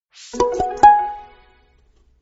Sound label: nokia windows notification ringtone